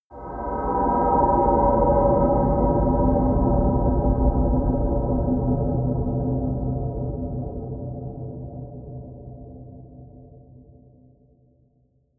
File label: air grey wind, horror